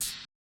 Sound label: fairy shot; the-lost-memory